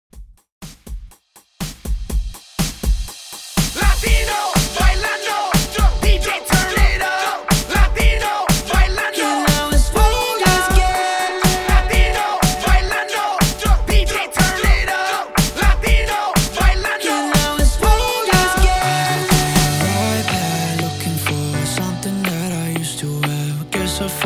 HYPE REDRUM 122 BPM

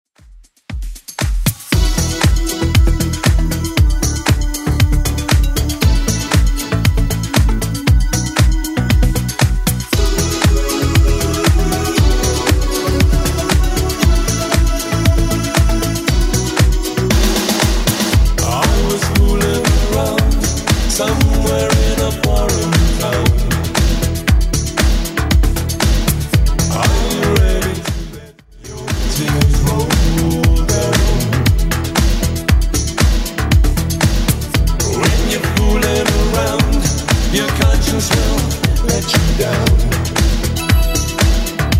80s Club 117 bpm; 80s Club Edit

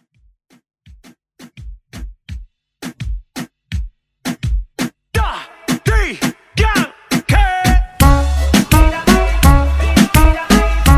Reggaeton Redrum

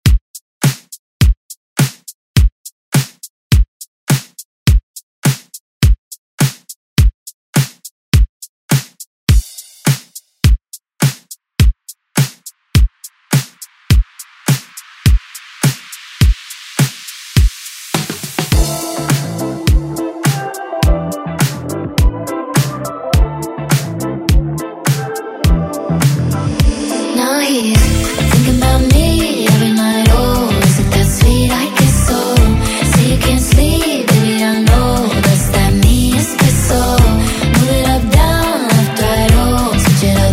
Hit Pop Redrum; Deejay Hit

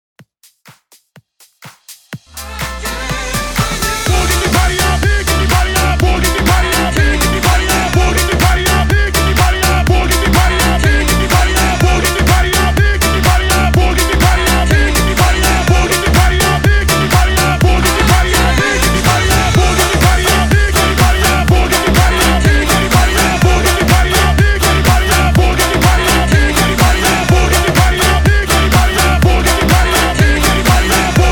HOUSE HYPE MIX 124 BPM

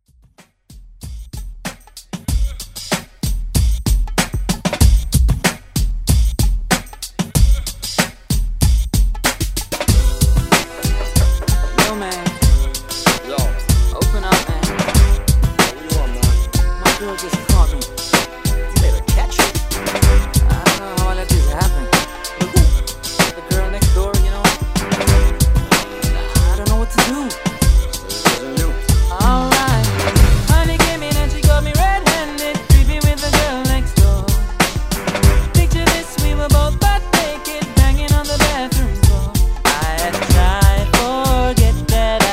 Throwback Redrum

